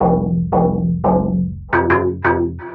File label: Stab Loops; neuro; Optiv & BTK